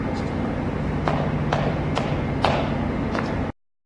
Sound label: high knee, Single Rope; Gymnastics; 2 step; rope jump